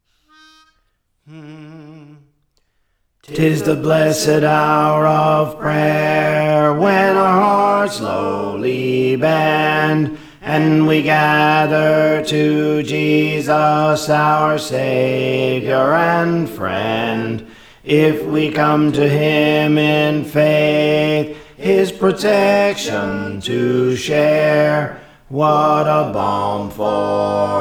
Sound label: Bass; Tis the Blessed Hour of Prayer; Praise For The Lord Training